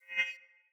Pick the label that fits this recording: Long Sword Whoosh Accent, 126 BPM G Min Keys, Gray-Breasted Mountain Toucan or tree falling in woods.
Long Sword Whoosh Accent